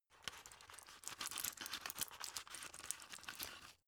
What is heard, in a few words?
drug put in shaky; berufe; arzt